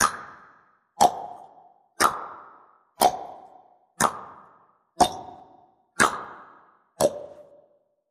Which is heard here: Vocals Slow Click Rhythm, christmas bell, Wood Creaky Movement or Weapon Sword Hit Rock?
Vocals Slow Click Rhythm